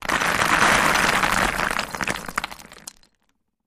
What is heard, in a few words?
Rock Cinder Block Deb, rocks & dirt